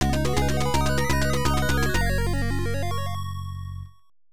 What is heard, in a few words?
Special Fanfare; madou monogatari ars; pc-9801